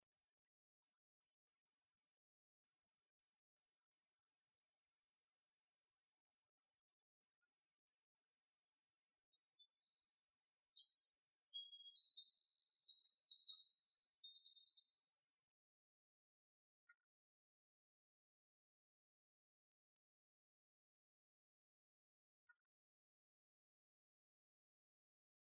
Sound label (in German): Lichtung Soundaufnahme Botanischer Garten Marburg